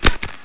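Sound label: sniper clip in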